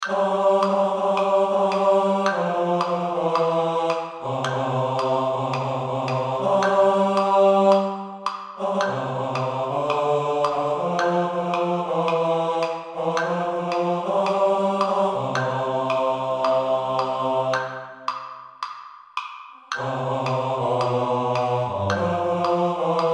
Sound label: Men Bass